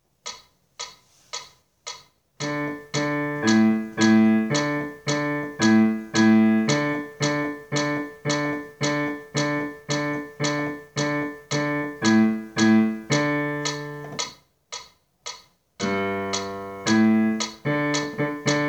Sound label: Bass